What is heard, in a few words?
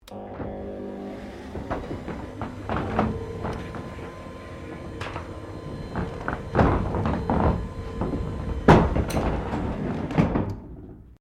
Door Roller Aluminium Garage Electronic Raise; Various doors; Miscellaneous Doors